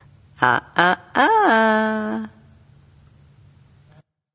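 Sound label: disagree, female; smite; voicepack; hera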